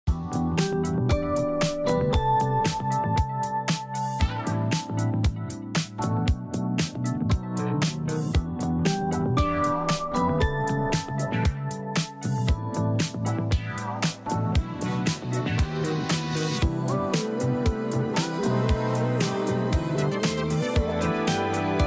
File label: smooth remix